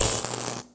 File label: Tesla attack